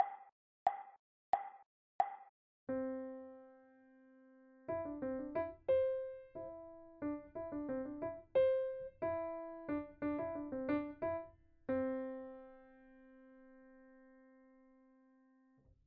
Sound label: Three notes